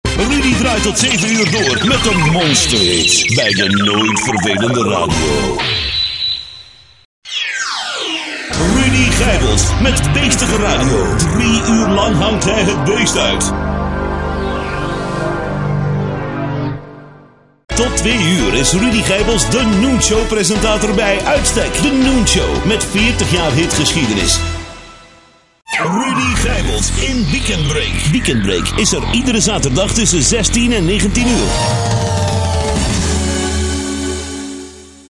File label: jingle; Golden Flash